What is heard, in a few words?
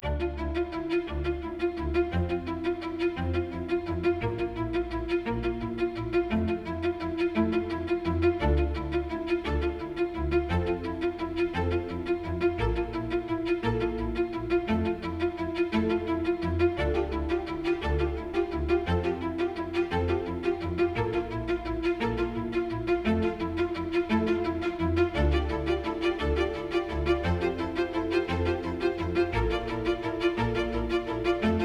Music Only